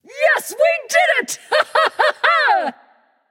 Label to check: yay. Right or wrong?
Right